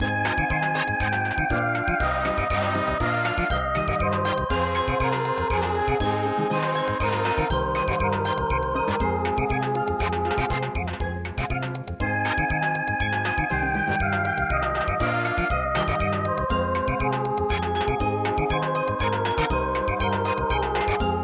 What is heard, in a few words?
Samba